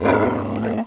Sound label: dog; Mafie 1